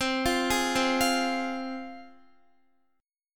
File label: C Suspended 4th Sharp 5th, C, down, Guitar; Csus4#5 arpeggiated